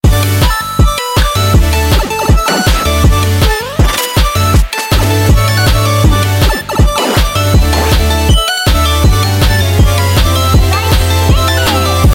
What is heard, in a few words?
Boss Stage